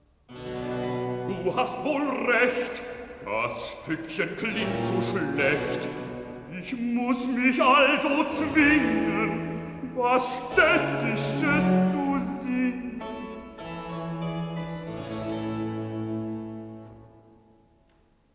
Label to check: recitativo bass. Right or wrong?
Right